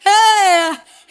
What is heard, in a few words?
"ha" SUNG; Voice; ooh ahh